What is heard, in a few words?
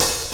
mellow open hi hat sound c key, Royality free open hat one shot tuned to the C note